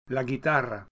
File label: guitar